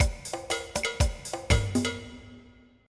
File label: calypso; General MIDI (type 1)